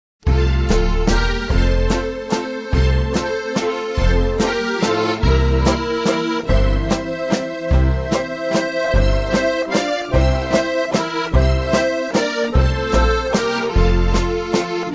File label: waltz